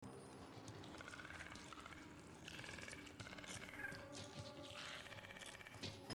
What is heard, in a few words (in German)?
Ruf Praedator